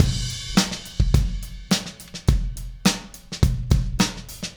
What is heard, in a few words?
Beat Crash; Sonic Foundry (Sony Creative Software); Indie Rock Anthems